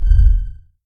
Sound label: Appear